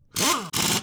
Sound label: wheel